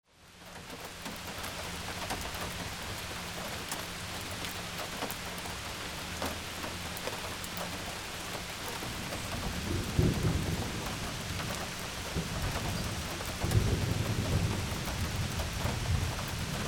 RAIN

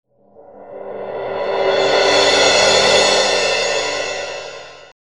MUSICAL

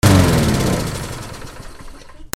GARAGE

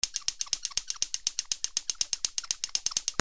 TOYS

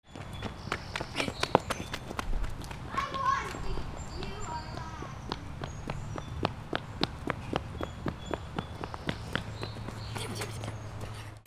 FOOTFALL

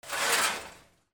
KITCHEN

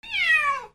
ANIMALS

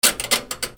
HOUSE

